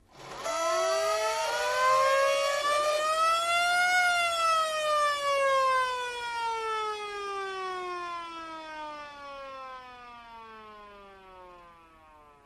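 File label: Hand Turn Siren Wind Up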